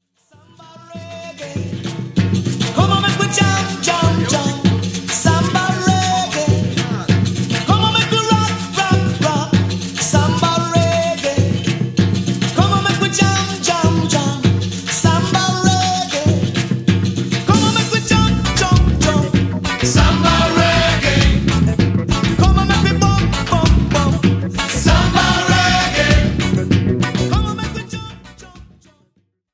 Samba Reggae

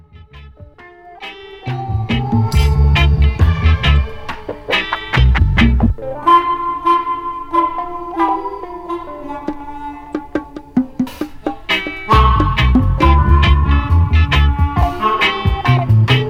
Harmonica & Bongo Dub

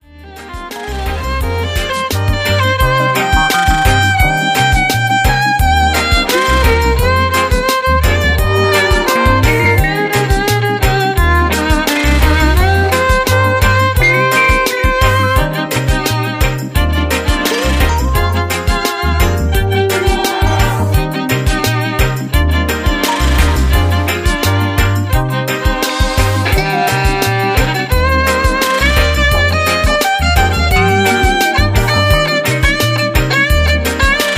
Jazzy Reggae

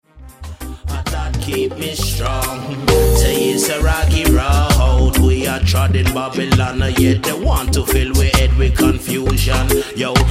Roots Rock Reggae

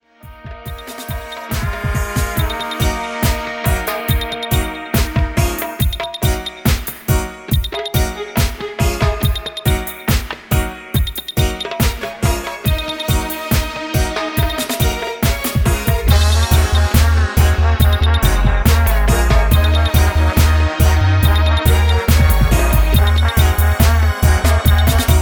Roaring Brass

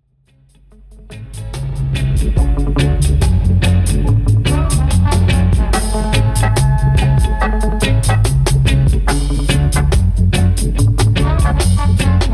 Flute (Instrumental)

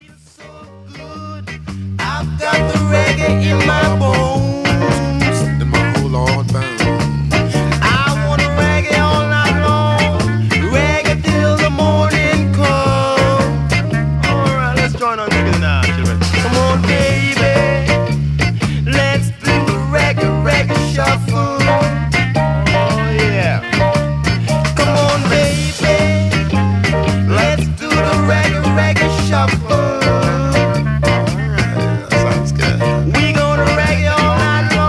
Reggae Shuffle

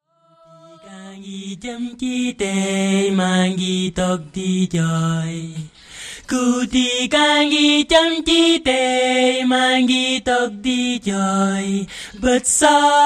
Bonus (Acapella)

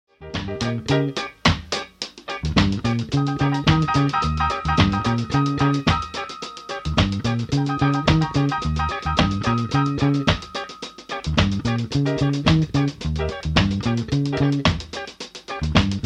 reggae

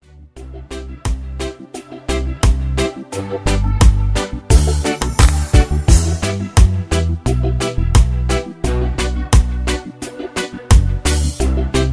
Instrumental

